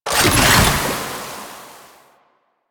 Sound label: Alchemy Grenade Deposit Toxin; Warframe; Entrati